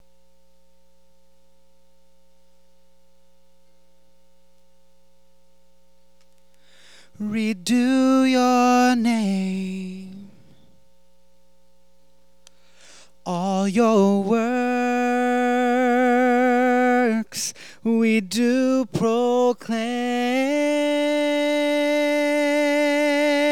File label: VOX